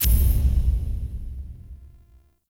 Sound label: Continue, UI